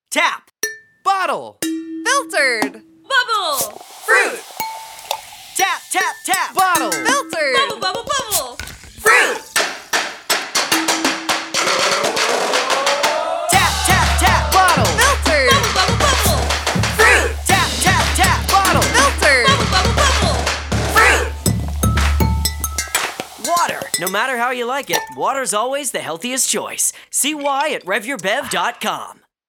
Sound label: jingle